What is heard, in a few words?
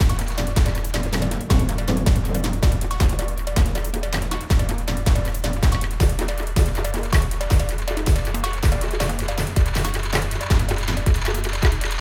Music; Sifu